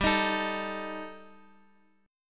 G Suspended 2nd Sharp 5th, Guitar Open F; Gsus2#5; strummed; x,14,15,16,x,x

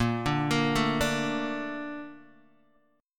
Bb Major 7th, Bb, down, Guitar, DADGAD

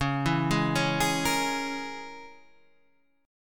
Db Minor 6th Db Guitar Half Step Down, Dbm6 chord; Dbm6 arpeggiated; JGuitar

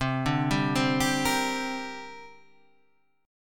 C 7th Suspended 2nd C down Guitar Half Step Down; C7sus2

